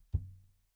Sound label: FLOOR TOM, ELECTRO KIT